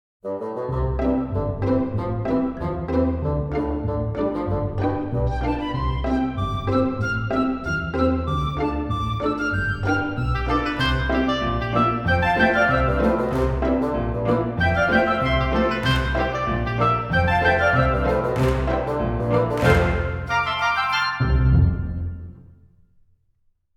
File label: comical, child